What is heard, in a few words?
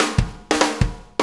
Shuffle Fill, Drum Tools